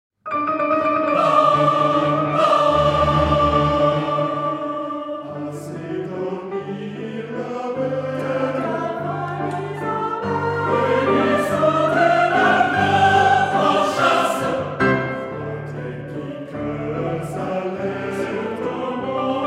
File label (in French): Chansons a quatre voix; Schmitt; I. Vehemente; Musique francaise retrouvee